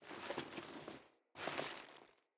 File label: Foley Move Short; Friendly; Deora